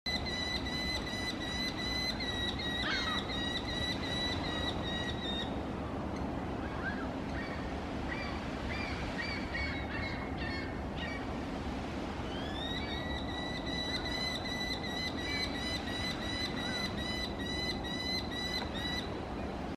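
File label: Merlin, bird; ترمتای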